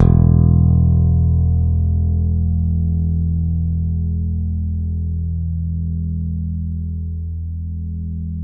open E1, Bass; Masterbits CLIMAX 7 - Bass; KEN SMITH BS

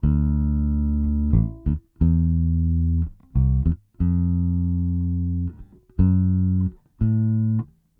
Bass, 70s Analog Funk

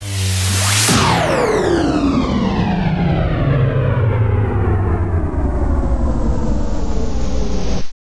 VITRIOLIC, Trance; ILIO; Trance Fusion; STOP LONG

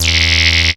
CREEPY E1, sequencer; Best Service Alpha Dance vol.2

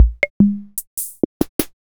Aria Diamond

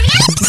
Tape Pull; Proteus 2000; Digital Sound Factory Proteus 2000

